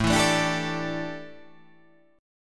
A 7th Suspended 4th, chord; x,12,14,14,14,14,11,11

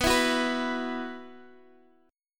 B Suspended 4th B Guitar Open D, chord

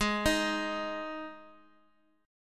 Ab 5th Ab; arpeggiated; JGuitar

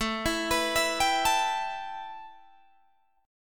A 7th Suspended 2nd A, Guitar DADGAD; A7sus2 arpeggiated; 19,19,21,21,22,19

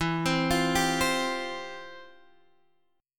F Major 7th Suspended 2nd

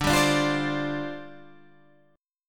G 7th Suspended 4th D

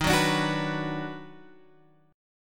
Eb Minor Major 7th Sharp 5th, Guitar Drop D

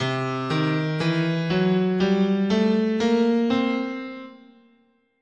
C Hungarian Major, scale

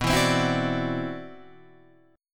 C-Major Sharp 11th B, guitar

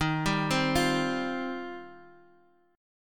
F 7th Suspended 2nd D sharp

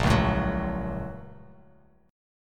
Bb Minor Major 7th Sharp 5th, Bass Standard; BbmM7#5; strummed; 6,4,7,11; JGuitar

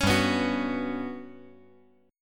G Major Sharp 11th G down 5G 4C 5E 5A

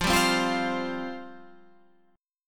F Suspended 2nd, Guitar, Half Step Down

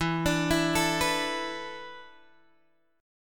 E 7th Suspended 4th, Guitar, Open G

